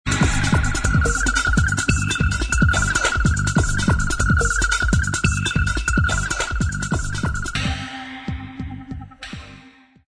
break beat

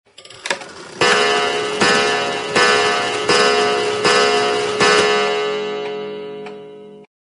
eight day clock slow chime

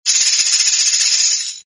tambourine rhythm short shake

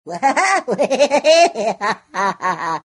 comedy goofy laugh

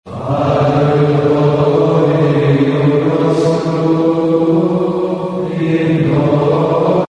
monks chanting

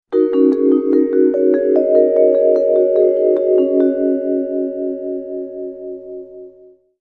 Underwater Mermaid Melody

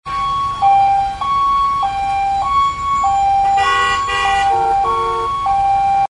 siren two tone ambulance in traffic

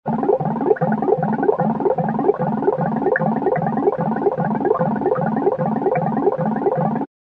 funny warble pump

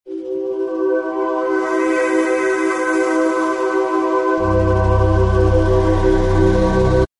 angel choir; fantasy

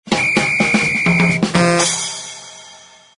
whacky drums

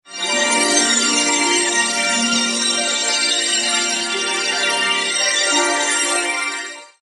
Fantasy Tone

